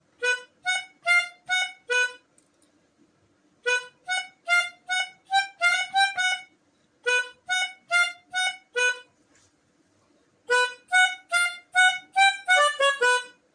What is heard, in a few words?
melodica